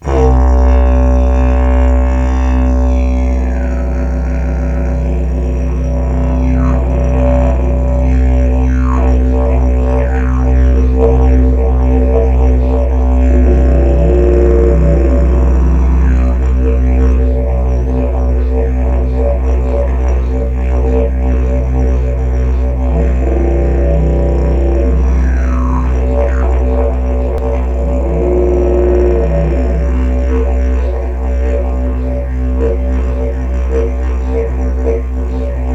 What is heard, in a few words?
didge grande b 62hz toot mib